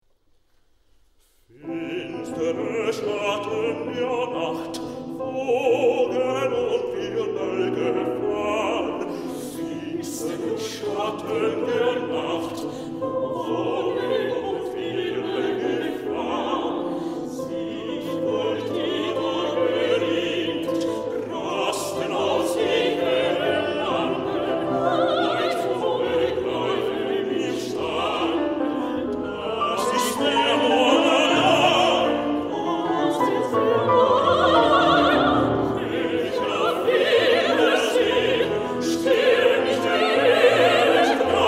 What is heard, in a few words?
Chorus; brahms; neueliebeslieder